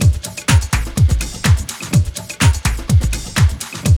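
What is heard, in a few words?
drum loop; X-Static Goldmine 3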